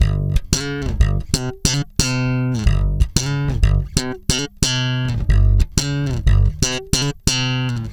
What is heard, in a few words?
SFNK G#, Bass, FUNK; Spectrasonics; Bass Legends